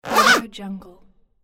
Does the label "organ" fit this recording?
No